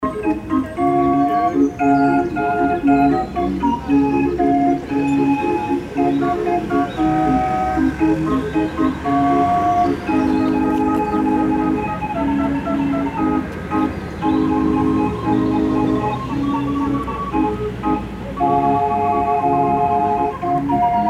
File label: hurdy-gurdy birds park atmo